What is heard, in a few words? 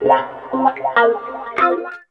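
guitar; East West - Phat & Phunky CD2; 90's Sample CDs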